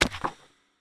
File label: card release